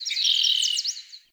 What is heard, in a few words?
Forest Open Glade Bird Med; Ambience; Day